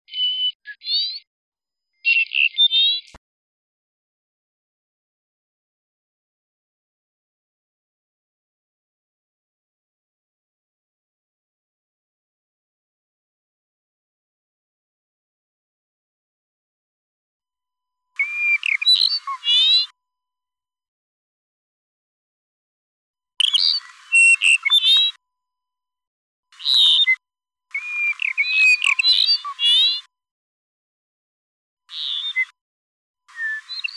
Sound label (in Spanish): canto, especies amenazadas; conservacion de especies amenazadas; biodiversidad